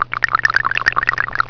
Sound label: cliks